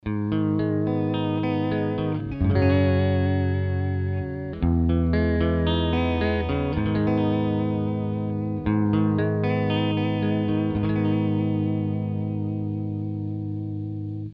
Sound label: medspeed